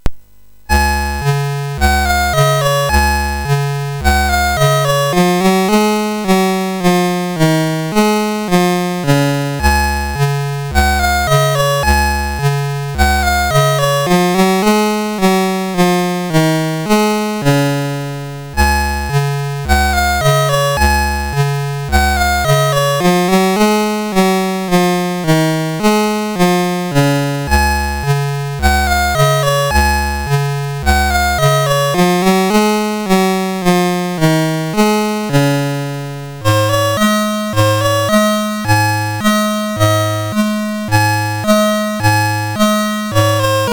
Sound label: sid MOS6581R4, GAMES; Forest of Doom